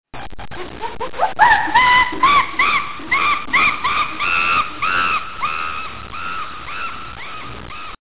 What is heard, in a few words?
chimpanzee